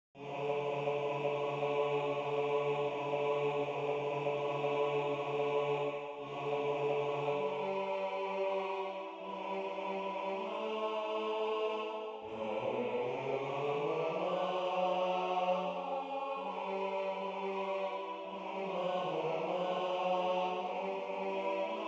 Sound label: Bass